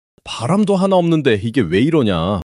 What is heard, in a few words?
surprise